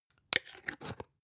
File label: spice jar big scratch close slow; industrie wirtschaft; gastronomie